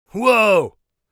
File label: whoa, warrior voice; Warrior Voice Pack